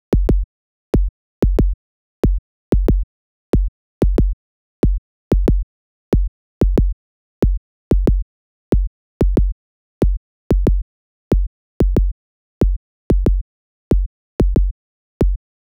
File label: fruity kick